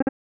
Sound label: Cancel